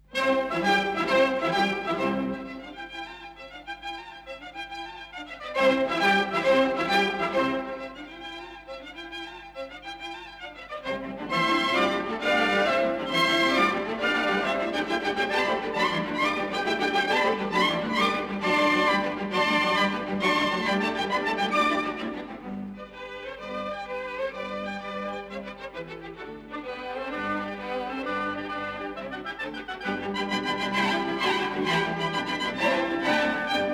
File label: Symphony in C - II. Andantino grazioso; Mozart; Symphony No.22; K.162